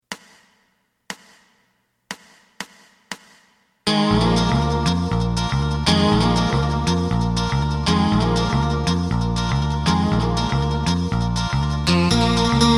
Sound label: drum